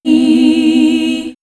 Monks Nuns, vox; vox mix; X-Static Goldmine

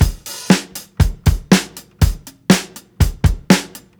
8TH BEAT, breaks, drums; Basement drums vol 2

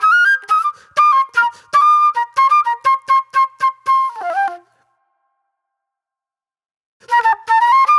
Fast Funk Flute

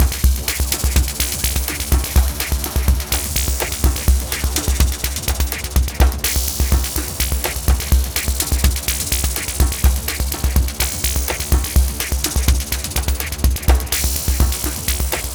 Synthetix, Processed Percussion

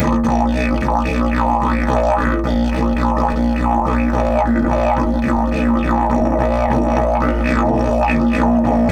108, Didgeridoo

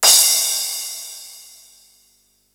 crash, Yamaha RY-8

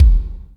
POWER KICK, ACOUST KICKS; Greytsounds

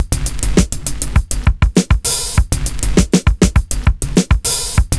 BOOM TAC, loop; Univers Sons - Kitch 70s

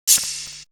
Perc; Coldcut's Kleptomania VOL1

